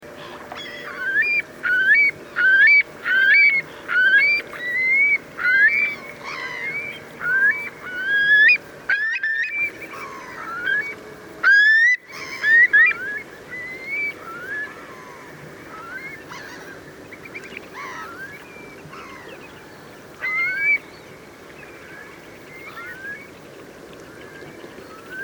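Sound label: eurasian curlew, flight call; plouezoch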